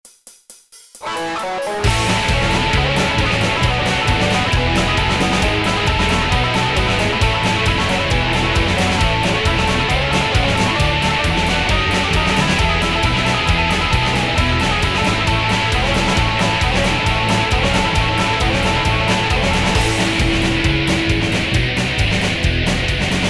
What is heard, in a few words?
Instrumental